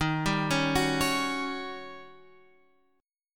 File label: D sharp 7th Sharp 9th D sharp Guitar Open F; D#7#9; arpeggiated; x,10,7,8,9,8; chord shape